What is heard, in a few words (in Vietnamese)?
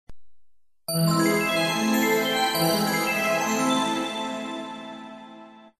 Am thanh phu thuy vay dua than